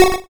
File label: YM Arp F#; chip hits; arps; CHIPSHOP DELUXE